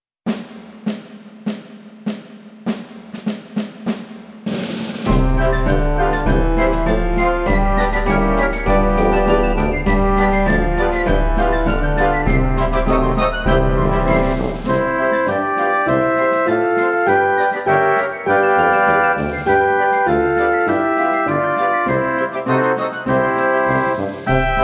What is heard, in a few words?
C D E F G March